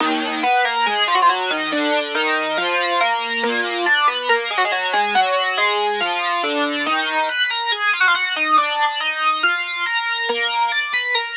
Riddim Melodic Loop D# 140 Eastern; Ghosthack; Riddim Essentials Volume 2

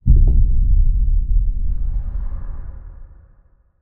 Explosion Deep

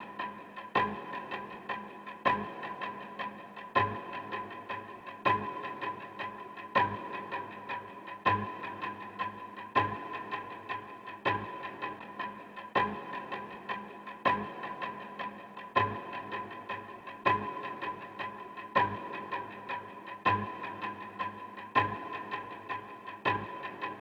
Percussion

